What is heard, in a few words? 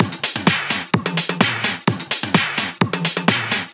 Minimal Loops